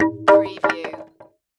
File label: Wood Beam Drop; Sound Effects Bible